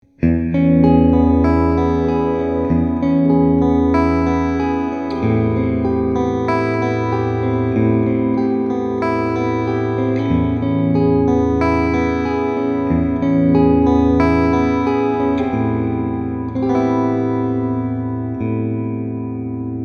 ibanez tm1803m talman prestige clean picking bridge and middle full